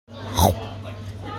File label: Chomp